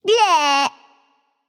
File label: tongue light; smite; voicepack; hel